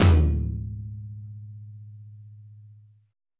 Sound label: tom A, Drum Tracks, 072 G#min